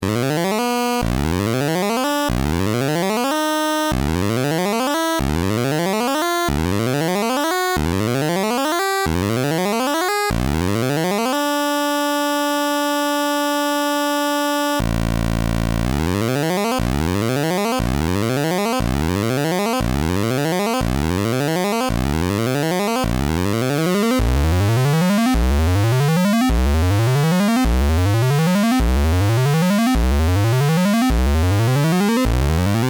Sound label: vco pulse square with seq, analog synth